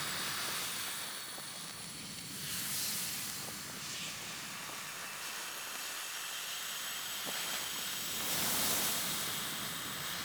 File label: Energy Restore Courage Loop; Objects; Energy Restores